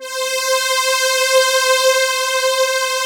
STR SYN, SYN STRGS

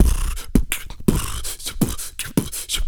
BIG LIPS, Vocal Planet Blues Soul; Spectrasonics

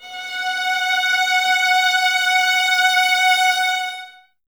F#5, 11 Violins, Soft; Miroslav Vitous

